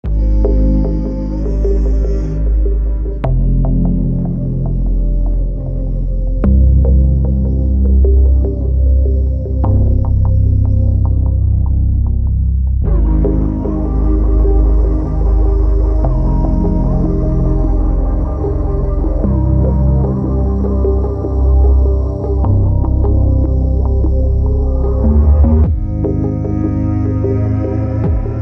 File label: Dystopian; Film; sync licensing